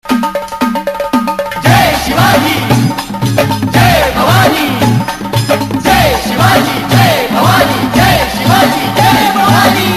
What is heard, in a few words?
Marathi Ringtone